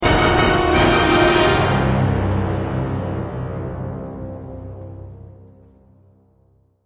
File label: Stab Present Danger; Doctor Who; Adventure Games; Episode 2